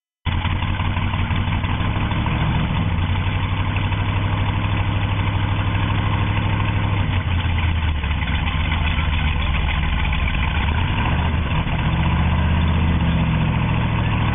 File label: 66 442, exhaust systems